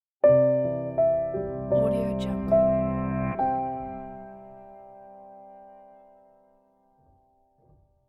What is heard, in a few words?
simple elegant logo piano